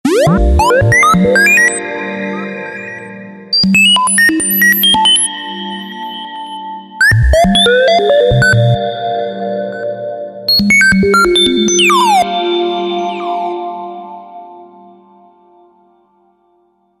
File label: Lumia 800 Bubbles